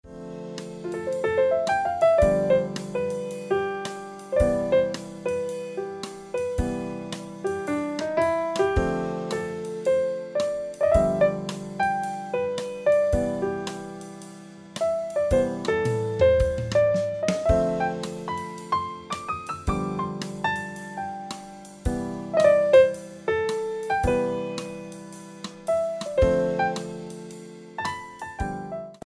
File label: Piano Music